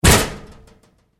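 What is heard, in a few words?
Door Locker Impact Close Up Hard, Metal Door; Doors; Big Room Sound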